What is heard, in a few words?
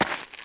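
footstep gravel; UrbanChaos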